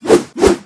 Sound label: King Crab